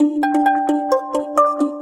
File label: music woodblock, notification; Samsung GT i7680